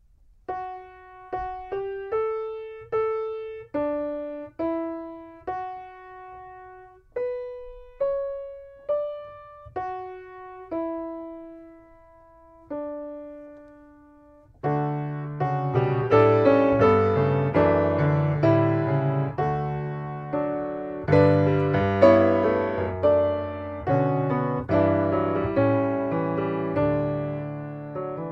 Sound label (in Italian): Corale per pf.; Fabrizio Puglisi